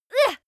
female moan pain very short soft; soundpool

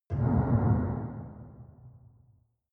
metal impact medium

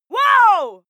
female shout outraged; schreie; menschen

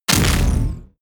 sci-fi plasma cannon fire

